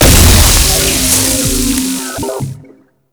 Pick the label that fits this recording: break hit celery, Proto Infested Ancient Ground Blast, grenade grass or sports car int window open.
Proto Infested Ancient Ground Blast